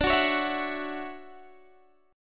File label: D-Major 9th, D, Guitar, Open G